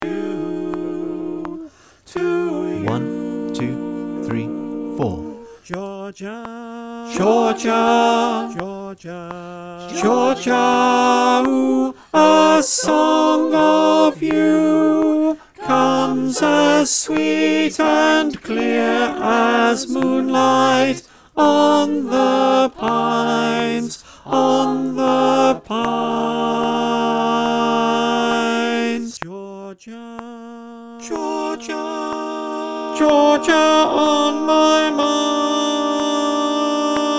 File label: tenor click